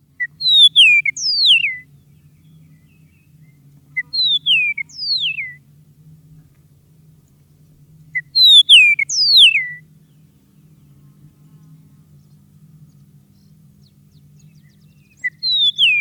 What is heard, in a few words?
Eastern Meadowlark Song; AZ